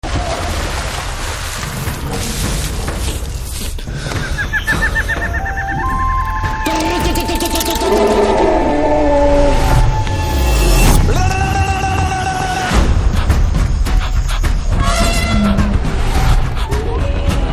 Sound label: Aranya Telugu Trailer BGM Ringtone